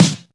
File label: Claps & Snares